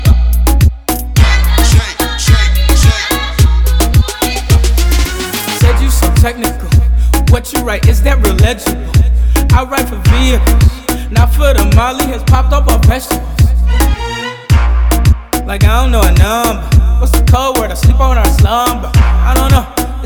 Ragga Clap

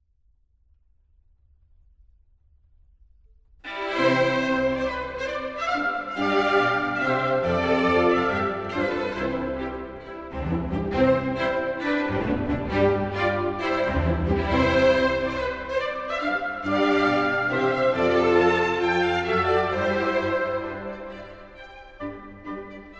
Cello Concerto, Chamber Orchestra; Cello Concerto No 1 in C Major Hob VIIb 1 I Moderato

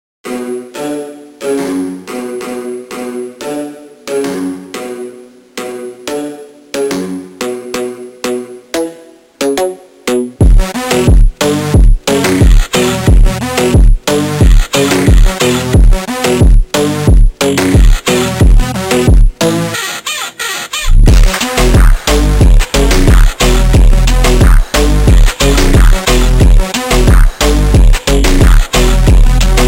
INSTRUMENTAL SLOWED REVERB

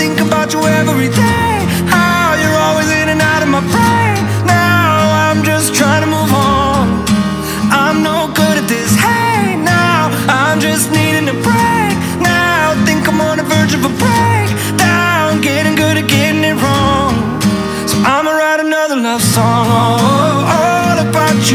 Acoustic

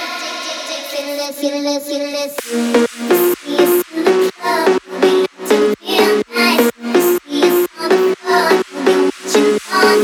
Phonk

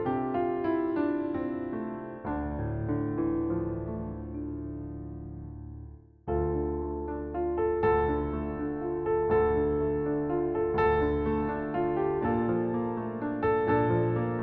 D Minor For Piano

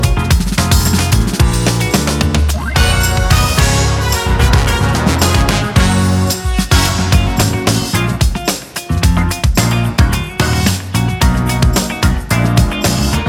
Instrumental

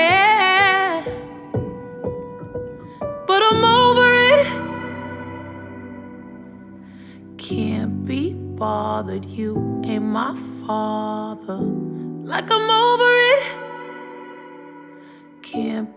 Felt Piano

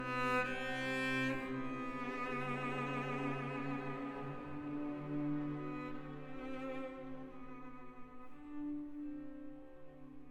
Cello Concerto, Symphony Orchestra; Cello Concerto in E Minor Op 85 IV Allegro Moderato Allegro Ma non Troppo

